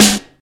perfect snare drum sample g sharp, Royality free acoustic snare sample tuned to the G# note